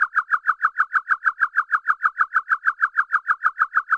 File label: Chirp FX DX100 A2; birds